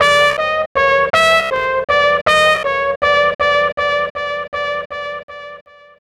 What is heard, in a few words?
Trumpet Echo; Keys; Horns; Drum & Bass